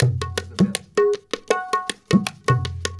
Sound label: INDIA R, Heart of Asia, IND ENS